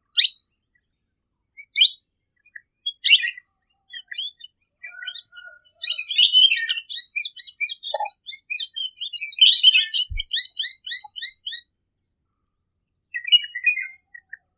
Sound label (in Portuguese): Canto do Periquito cabeca de ameixa